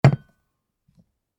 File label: Put down the wine glass